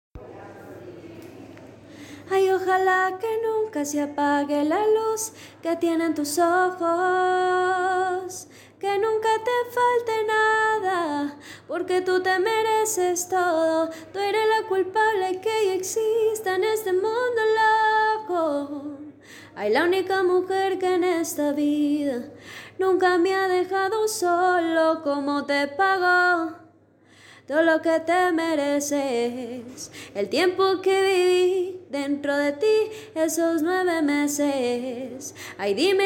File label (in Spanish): voz canta mas largo